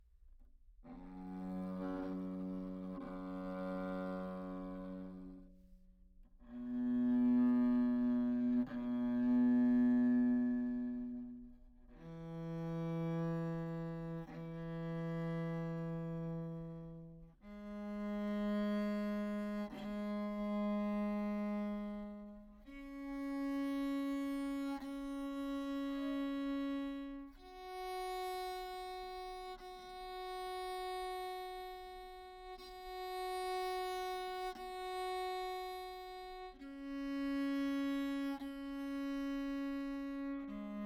open, tenor viol, Anechoic; OPENAIR

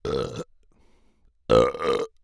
burp; OPENAIR; Anechoic

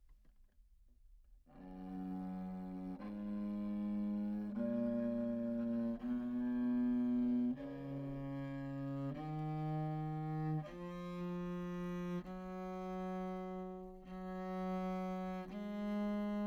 tenor viol, Anechoic

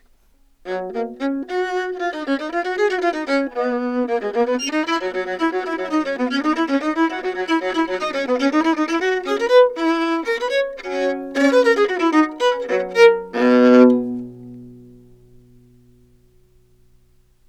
baroque viola, anechoic; telemann; OPENAIR

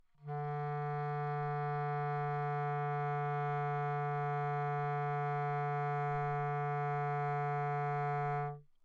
low, anechoic romantic clarinet bb; OPENAIR

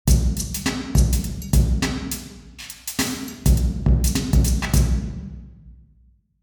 drum, church; ncem 5 piece band spatial measurements

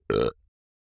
burp; OPENAIR; Anechoic

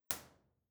main level, creswell crags

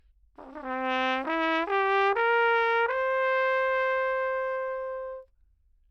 natural baroque trumpet mid, Anechoic; OPENAIR

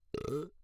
burp; OPENAIR; Anechoic

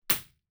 virtual IR; Live Room (Physics and Electronic Engineering Buildings); Virtual Recreation

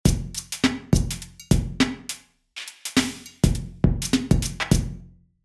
drum, maes howe

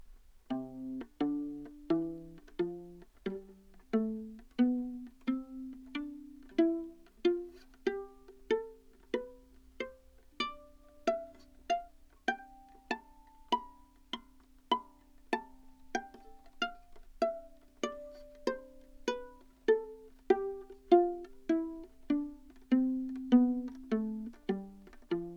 modern viola c major pizz, Anechoic; OPENAIR

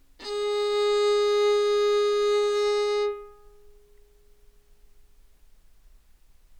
baroque viola, anechoic; OPENAIR

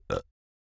burp; OPENAIR; Anechoic

